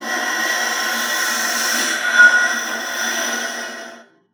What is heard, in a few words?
VIOLA FINGER; Xsample VOL-5